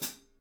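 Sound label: hi-hat Custom Foot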